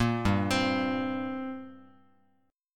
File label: G Minor Double Flat 5th, G, Guitar; arpeggiated; JGuitar